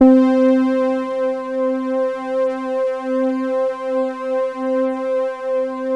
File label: C4, ANALOG PLUG; Sound Gallery - Charged